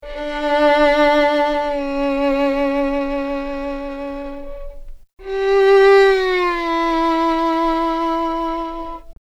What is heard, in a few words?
strings consort, sad loop; Loops